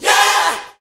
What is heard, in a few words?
"yeah" choir sung; ooh ahh